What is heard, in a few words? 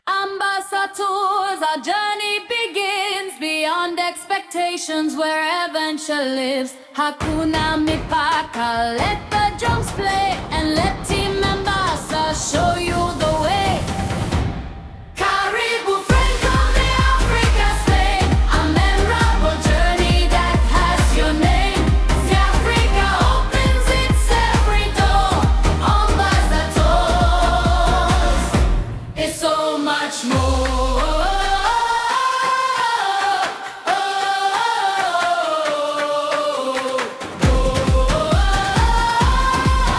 jingle; ambasa tours